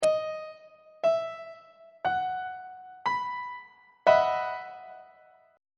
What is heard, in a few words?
e major 7th suspended 2nd 3rd inversion on treble clef